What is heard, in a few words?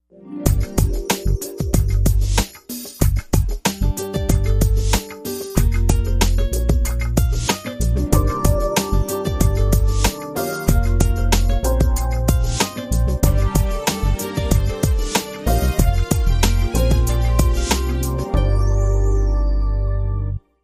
Luxury Lounge